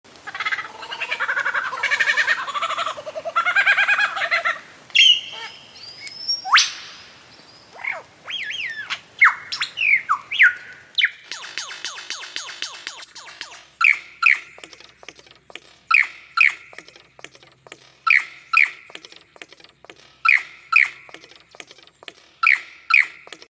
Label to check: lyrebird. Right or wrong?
Right